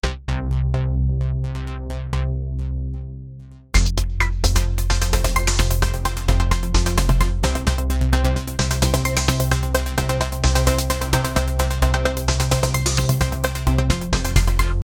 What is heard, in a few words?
deep electro f, electronic; reel video